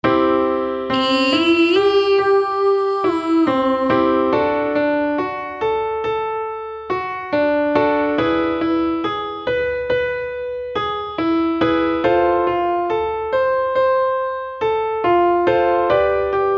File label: Triad Sus 5th Ee Oo